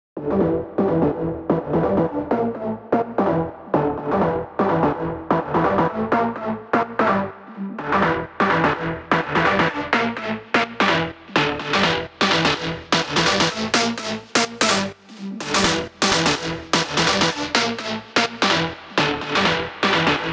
Break Lead 126 Em Tail

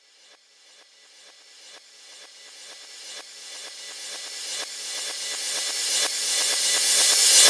C Reverse Cymbal

